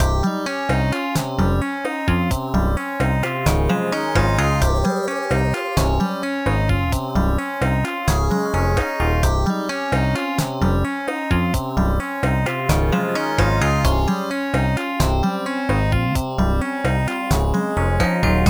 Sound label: Jungle; Proto; Spelunky